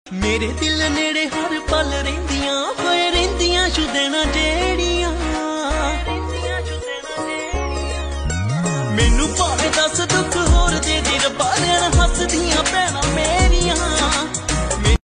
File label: Panjabi Ringtone